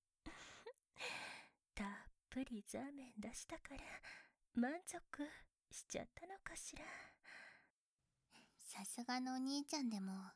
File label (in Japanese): ふたり で 耳舐め, ASMR